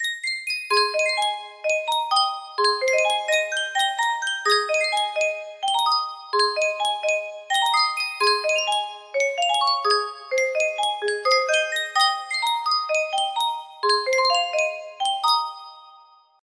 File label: yunsheng music box